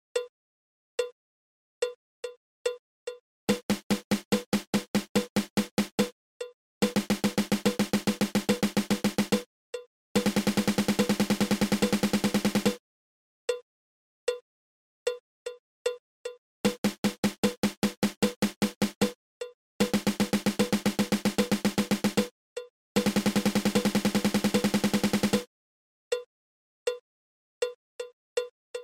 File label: Single Stroke Roll